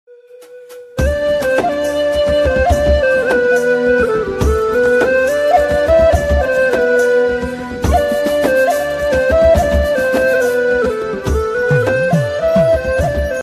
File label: melody bgm tamil rain; rain status